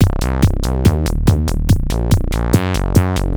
Disco Bass, Synth Bass Loops; Sonic Foundry (Sony Creative Software); Groove Elements

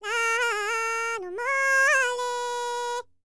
BULGARIAN, World Voices, BALKAN

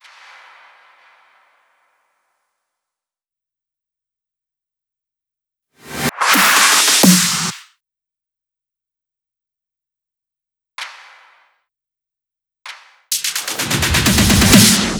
Drum Loop 128BPM FX Fill f#; VEC5; Drumloops; Multi Loops

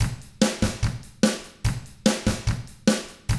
rock drums

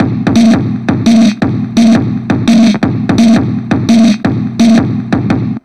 170, Drum Loops, Drum & Bass; 90 s Sample CDs; Total Drum&Bass

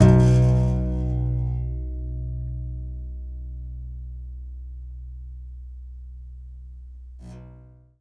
C. Bass Balalaika, Plucked Strings

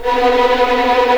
B3 TREM, VLNS TREMOLO, String; East West; The Ultimate String Collection

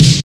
SNARE BIG, Big Beat; Big Beat Samples & More; AKAI

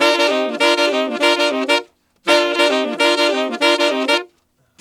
Sax Section in Bb, Jazz; Traditional; Sonic Foundry (Sony Creative Software); Jazz Solos and Sections

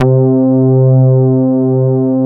Poly800 C2, Korg; Back In Time Records; Korg Universe VOL-1

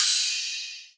Rides, Cymbals

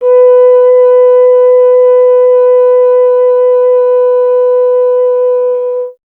BRS F. Horn, Solo Instruments; Miroslav Vitous; Solo Instruments VOL-2; Solo lnst. II

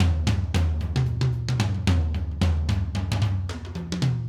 Acoustic Live Drum; Platinum Audiolab

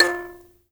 HIBZ KL F 4, HIBZ KALI; Heart of Africa

